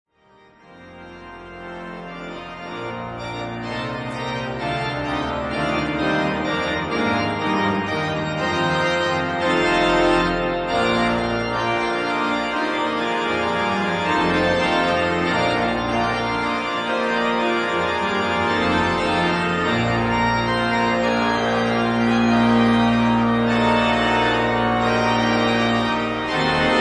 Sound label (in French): Grand choeur; Dubois